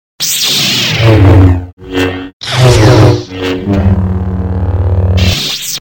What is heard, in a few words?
Lightsaber